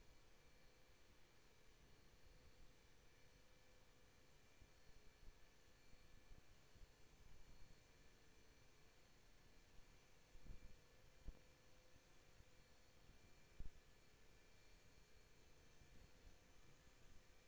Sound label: HYDROPHONE 16000Hz; JASCO AMAR HYDROPHONE 740